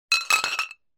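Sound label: several bottles taken at once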